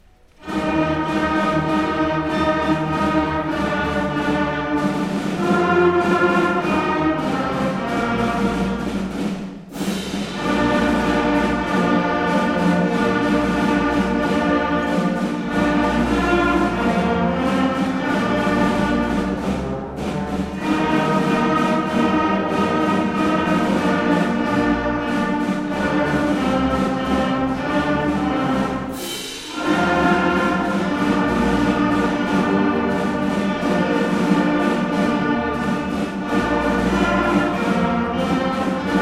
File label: Jingle Bells, Beginner Winter Concert; Knight Band